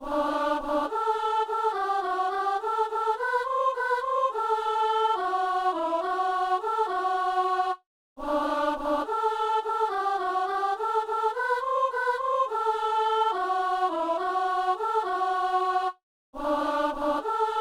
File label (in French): soliste et alto